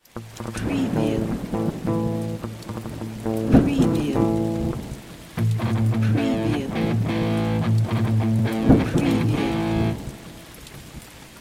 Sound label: Power Bastaki A5 Crunch 105BPM, گیتار الکتریک; پاور کورد بستکی 1 گیتار الکتریک; هنر صدا